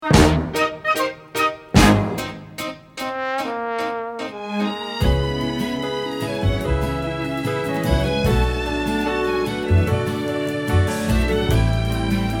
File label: karaoke